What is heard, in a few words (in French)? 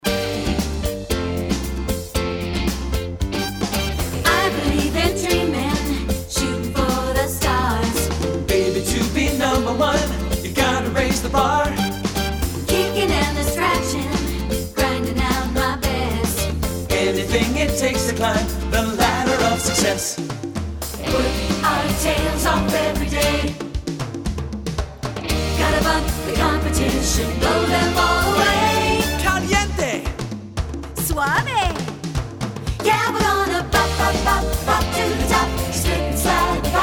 vocal